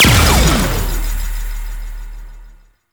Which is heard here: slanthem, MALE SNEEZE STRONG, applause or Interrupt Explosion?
Interrupt Explosion